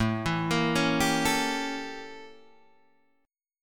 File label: A 7th Flat 9th Guitar Open D